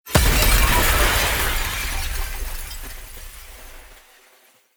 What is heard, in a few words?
glass